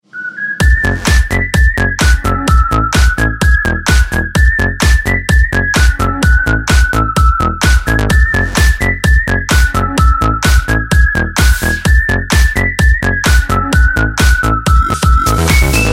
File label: Whistle Mix